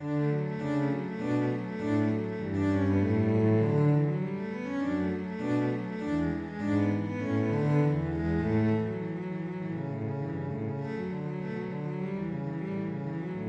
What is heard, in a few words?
cello duet; handel queen of sheeba